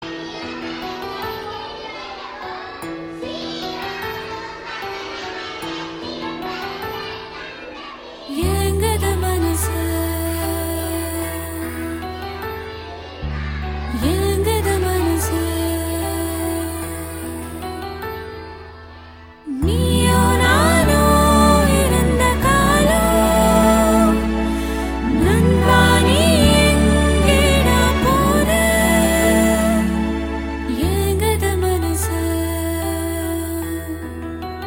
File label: Female; Ettuthikkum Madhayaanai (2015)